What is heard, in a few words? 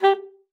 G4, SAXOPHONE; Session Instruments; Best Service ProSamples vol.42